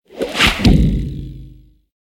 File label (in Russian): Звук ножа втыкающийся в дерево